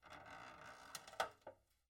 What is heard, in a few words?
door open; angband